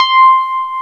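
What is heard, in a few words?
C4 electric piano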